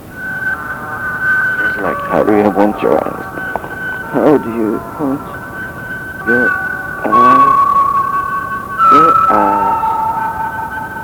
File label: bird killer glasshouse